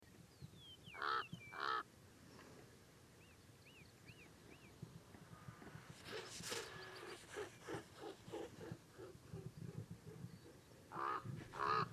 Common Raven, Corvus corax; jays and crows

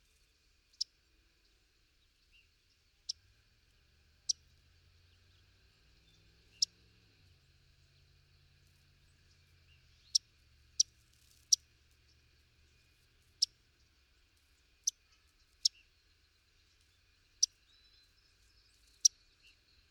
Yellow-rumped Warbler Setophaga coronata

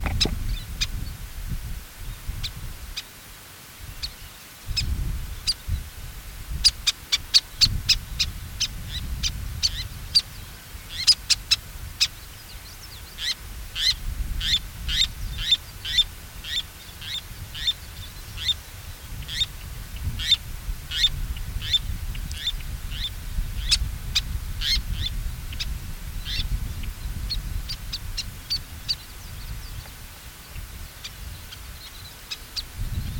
Least Tern, Sternula antillarum; gulls terns

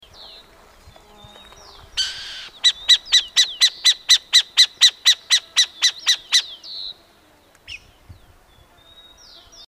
Burrowing Owl, Athene cunicularia; owls

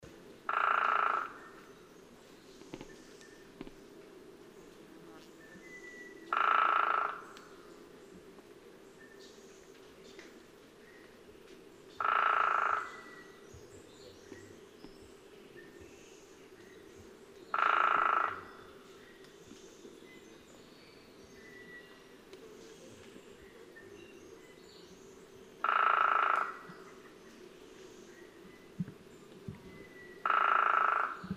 Hairy Woodpecker, Leuconotopicus villosus; woodpeckers

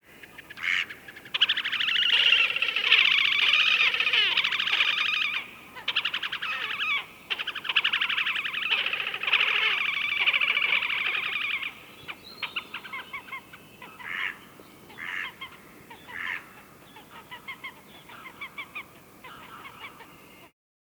Horned Grebe Podiceps auritus; waterfowl

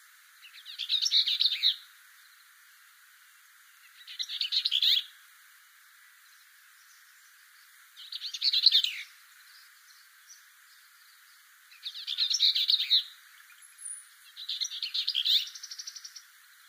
Bell's Vireo, Vireo bellii; vireos

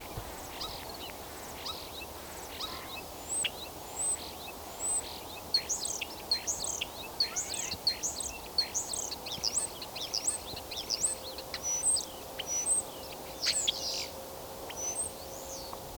European Starling, Sturnus vulgaris; blackbirds orioles

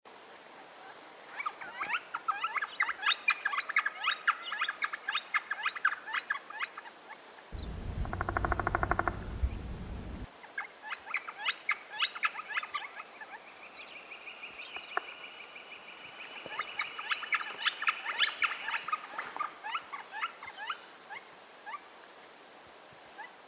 Gilded Flicker, Colaptes chrysoides; woodpeckers

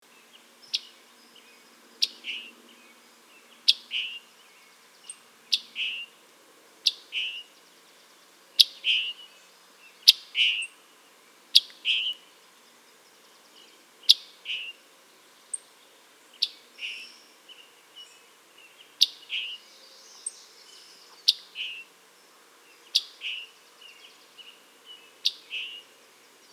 Scarlet Tanager, Piranga olivacea; cardinals tanagers grosbeaks

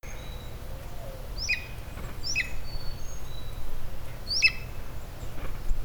Blue Jay, Cyanocitta cristata; jays and crows

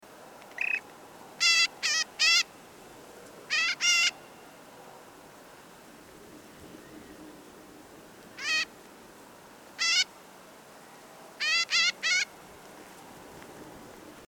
Northern Shrike, Lanius borealis

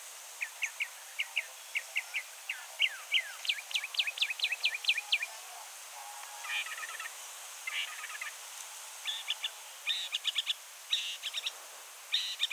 Northern Mockingbird Mimus polyglottos; thrushes thrashers

